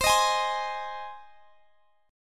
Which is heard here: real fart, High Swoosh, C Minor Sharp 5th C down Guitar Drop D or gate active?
C Minor Sharp 5th C down Guitar Drop D